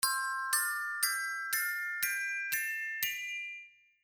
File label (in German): C-Dur-Tonleiter mit Akkorden und Stufen